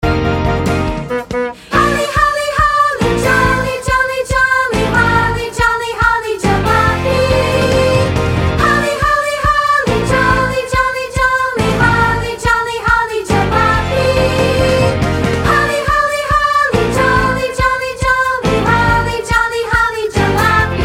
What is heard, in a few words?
Vocal Sample